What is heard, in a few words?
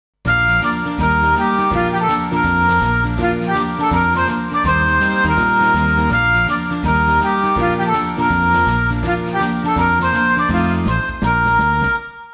comedy country shuffle